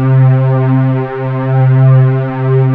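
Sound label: MKS80 STRINGS C5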